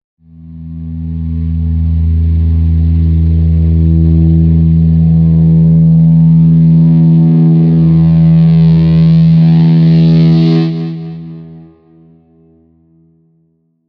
Electric Guitar Drone, Reverse electric guitar transition; Drones; Sound Design